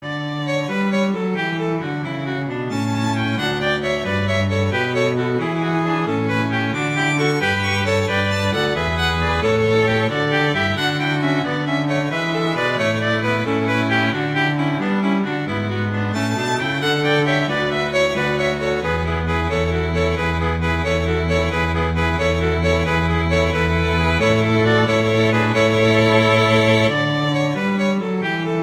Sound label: Gigue; Pachelbel; Canon